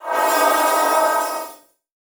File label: Transition Feedback Sweep